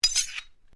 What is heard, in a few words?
Blade Swish Low, Sword